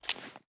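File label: pistol raise first start; gmod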